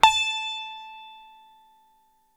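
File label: SITAR A 4 right, coral sitar, guitar; East West; Steve Stevens Guitar Samples Collection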